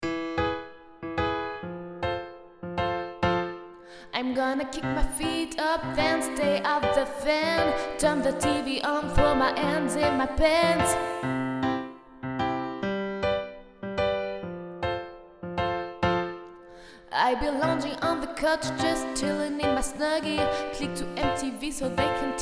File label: soliste couplet